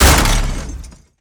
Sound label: Explo Small Plastic; Destruction